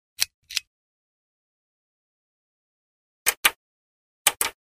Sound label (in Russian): Звук включения лампы